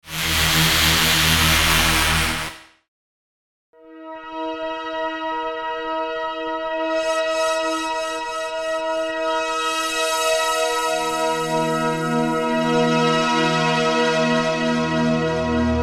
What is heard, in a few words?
Blofeld; Waldorf